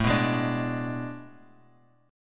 A Major 7th Suspended 2nd Suspended 4th, Bass Standard; AM7sus2sus4 strummed; 17,14,18,19; JGuitar